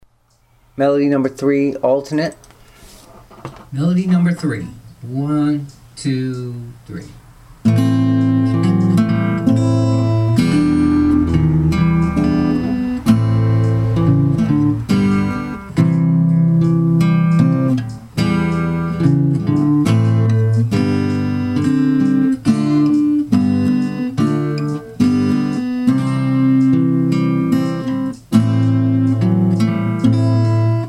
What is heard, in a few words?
melody alternate